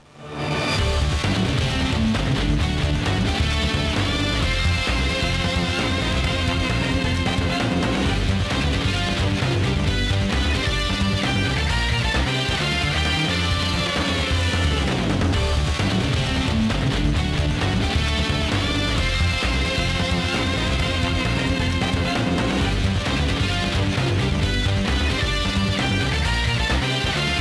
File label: BGM; J.League Winning Eleven Advance 2002; GBA